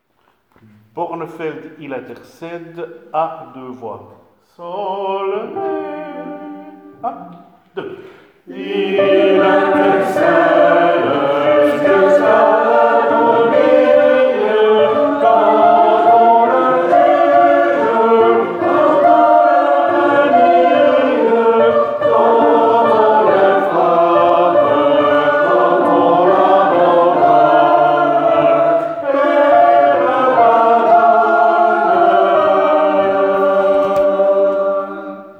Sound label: tutti; Bornefeld